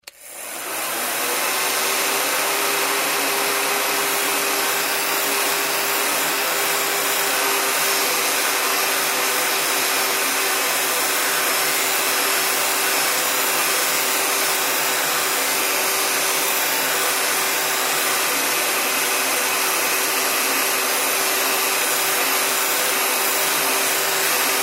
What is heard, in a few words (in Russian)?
bolshoj fen v parikmaherskoj